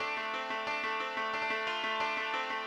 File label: ethno, 90, D